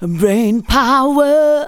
vox, Techno Trance; Ueberschall

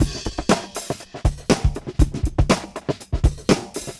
Drama Drums (120BPM); musicradar

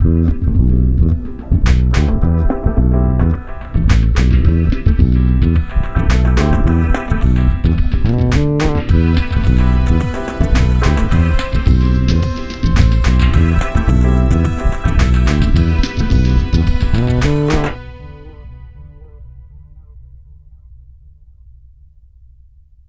SAMBA, Bass Grooves; USB Soundscan vol.30; AKAI

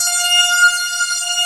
ANALOG GIANT; OMI - Universe of Sounds

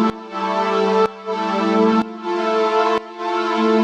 Pad DS201 125 A, sidechained

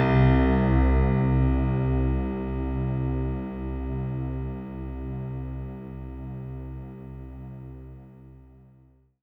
C2, Synth Line; 90 s Sample CDs; NiceBeats; SynthLine VOL-23

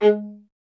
viola, solo instruments

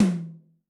Amb Tom M, Yamaha DTXpress-II

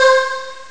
back chor; Amiga Soundtracker Sample Packs

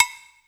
PC, Casio CTK-5000

